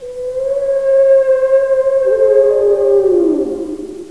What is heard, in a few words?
whale